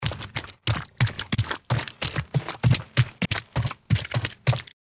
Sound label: Footsteps on mud shoes run